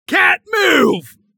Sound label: Walking in Sticky Goo